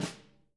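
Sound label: jazz snares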